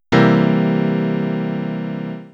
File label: C7 chord